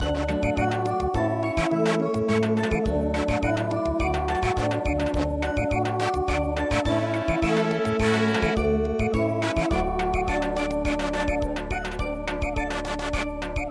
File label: WESTMINSTER CHIMES Samba